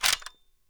mp5k boltback

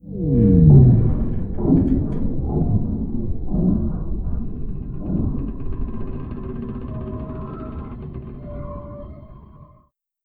Magnet Down; ttt foundation; scps

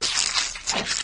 move, flood infection

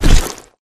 elite bodyfall; flood

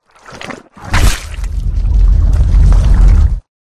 unarmed melee suicide; flood; carrier; predatorcz; halo

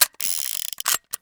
colt reload

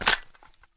mp5 40cal mag in

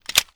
ots33 mag in

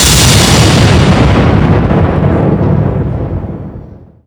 nuke detonate; serioussam2; weapons; seriousnuke

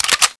bolt back; weapons

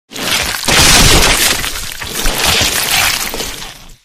human flood morph arrival

